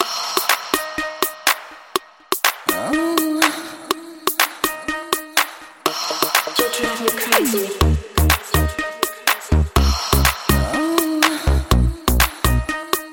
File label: grooving tune